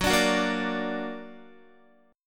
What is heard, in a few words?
Gb Augmented Guitar; x,9,12,11,10,9; Gb+ strummed